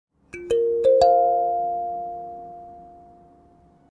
Kalimba

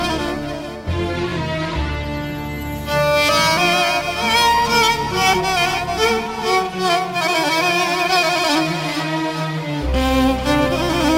instrument

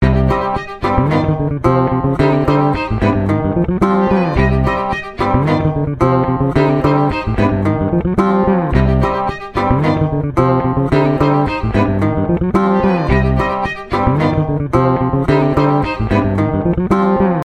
Bass ofline music

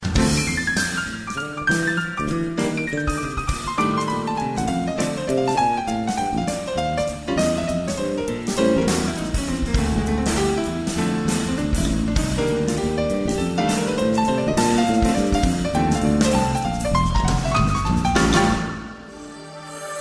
jazz nightclub tone

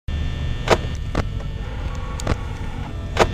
Screen Sound; FFNAF

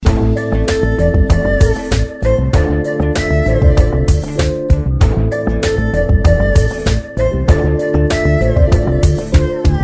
violin, sms; ringtones

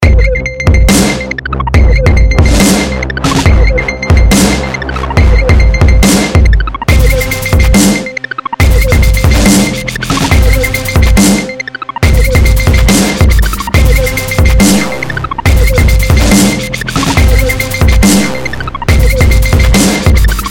sickest dubstep

